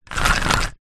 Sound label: leisure fishing reel turning reeling small short; zapsplat